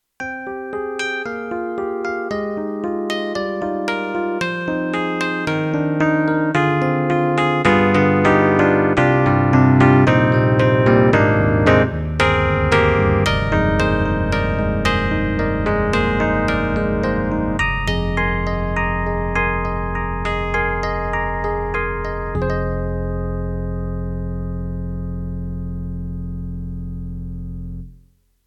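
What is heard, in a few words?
YAMAHA MU1000EX Diploid, piano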